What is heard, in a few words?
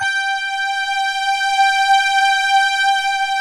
FM SYNTH; OMI - Universe of Sounds